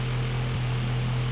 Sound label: ventilation